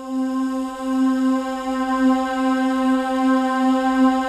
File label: VOCULARS; Pro-Rec - Synthsonic; JV FILM